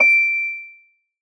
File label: soft hit whistle; osu!